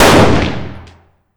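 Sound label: fire dist 556x45 rifle int; gmod; arccw uc commons attachments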